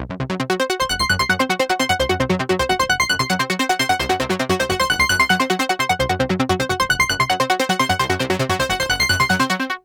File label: S+H splash, arpeggio 150; Masterbits - Tekkno Trax